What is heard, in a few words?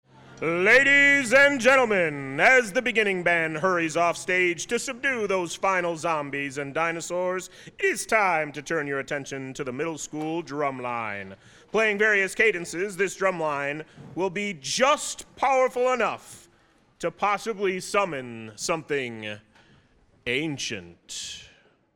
Middle School Drumline Intro